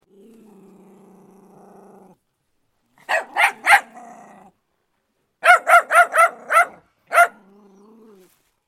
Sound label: dog growl and barks